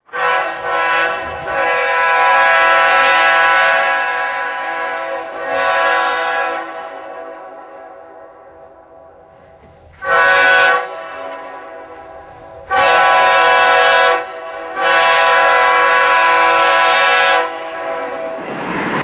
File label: loud train air horn blasts; horns